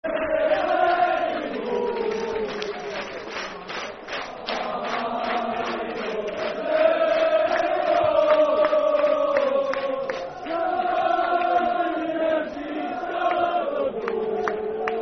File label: Football Chant; Dundee United Dufc